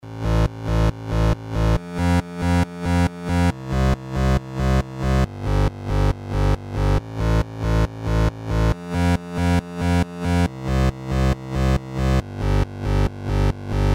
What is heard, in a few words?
Control Room